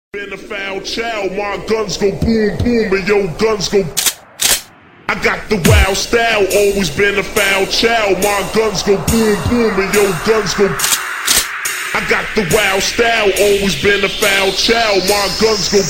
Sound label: dubstep